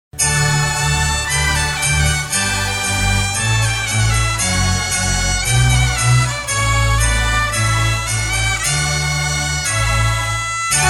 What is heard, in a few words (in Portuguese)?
instrumental melodico